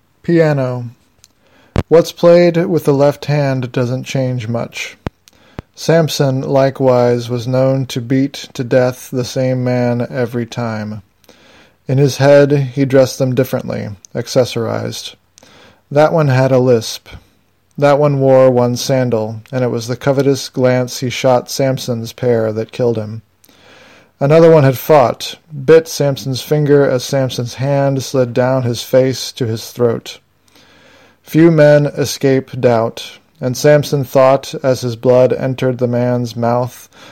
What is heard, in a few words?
Piano